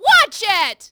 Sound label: yell; Matt Hoffman's Pro BMX 2; XBOX